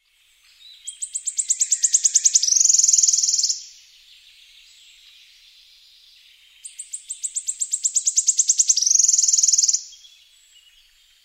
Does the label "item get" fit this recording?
No